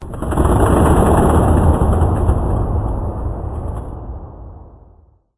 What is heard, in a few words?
amb muffled lo mach; video games; ambient